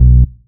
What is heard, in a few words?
Syn Bass; Equipped Music